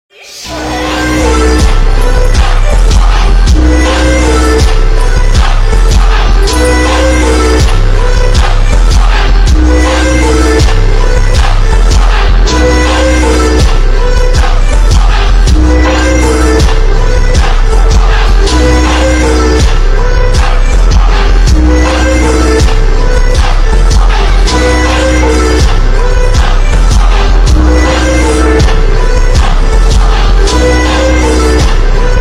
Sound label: Instrumental & Reverb